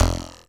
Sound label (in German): Feile